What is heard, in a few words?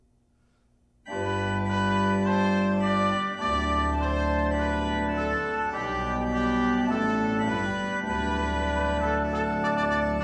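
Ode To Joy with organ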